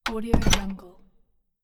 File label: vintage boat lower deck door; stormwaveaudio